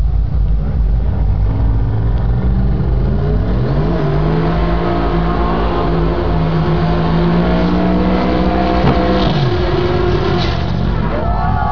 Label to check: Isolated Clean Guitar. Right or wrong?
Wrong